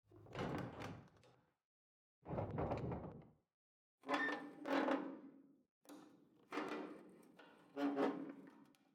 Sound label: Roof Metal